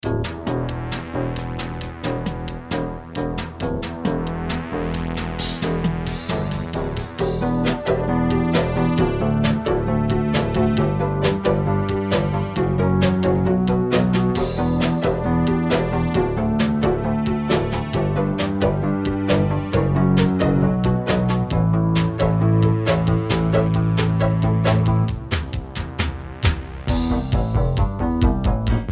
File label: Countdown Bed